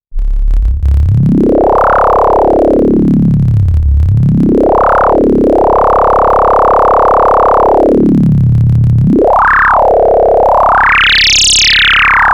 Saw Cutoff20 Reso80 variable Env Amount ADSR X Mod